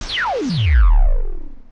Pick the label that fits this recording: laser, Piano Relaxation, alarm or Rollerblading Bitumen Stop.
laser